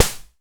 NOIZE; Masterbits - Total Zone; ADD.PERC.

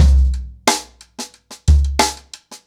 90BPM, ACOUSTIC DRUMS; Sonokinetic - The Roots Drumloops

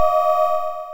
Cymbal 4 Osc Ride, Modular

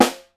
SN, Percussion

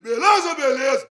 BRAZIL, World Voices

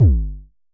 Festival Kicks - G1, Kicks, Vermona Kick Lancet; LookIMadeAThing Kicks

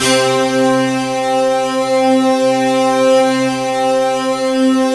BIG POWER, HEAVY SOFT; Sound Gallery - Charged

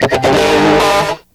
GRUZZ RIF, Stereo Guitars; E-MU Formula 4000 Series Vol. 1; Hip Hop Nation

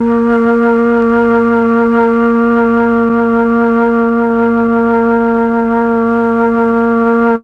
flute; ILIO; Propeller Island Legendary M400

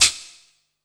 PERC -R, 168 BPM Drum & Bass; Ueberschall; Drum & Bass Resonance

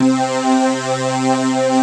SYNTHS; Pro-Rec - Synthsonic

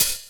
Open Hhat, Hihat; Equipped Music; Loungin House

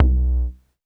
polyvox tom, Polivoks; Formanta Radio Plant

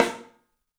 MARCH, 3D Drums; 3D Sound; MARCH XTREM

